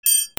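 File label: percussion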